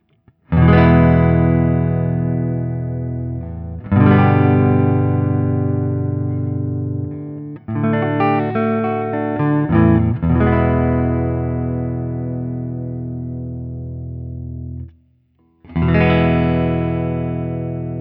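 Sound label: Guild M75 ODS100 Open, guitar; Aristocrat; Gold; goldtop; 2014 Guild Newark St. M75 Aristocrat in Gold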